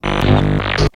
OP Start Bass; 音MAD; 命运石之门

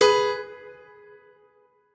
piano

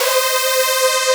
synth

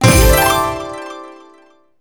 SE SUCCESS; maimai

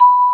Ding; 轻音少女

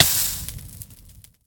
fire hurt; no delay; Minecraft; entity; player

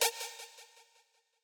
stab

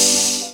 Open Hat; 音MAD; 学园孤岛

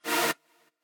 chord

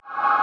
ambience

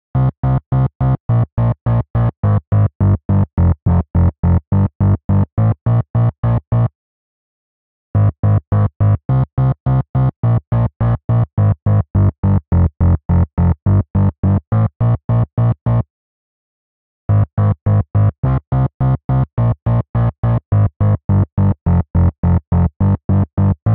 bass sub bgm

